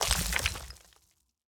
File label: environment block upgrade stone